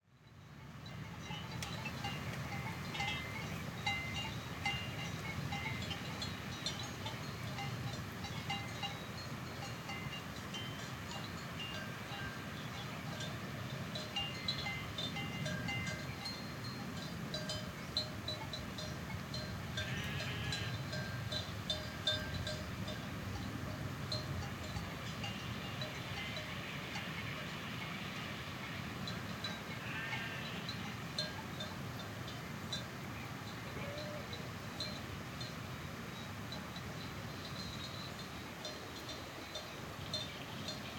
Summer Mountains Ambience Altitude Sheep Herd Bells Distants Marmots Wind; Spectravelers